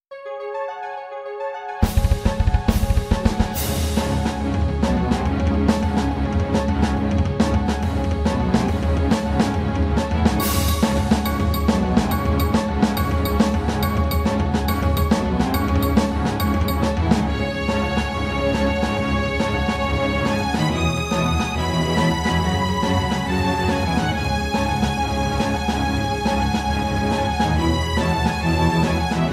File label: Battle Theme; Pokémon Brick Bronze; Eclipse Grunt Battle Theme